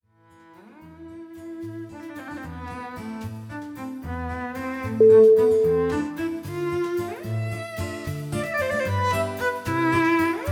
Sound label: paris waltz